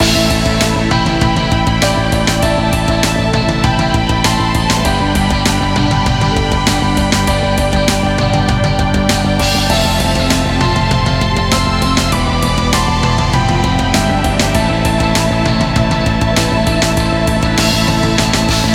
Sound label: Forest bpm 99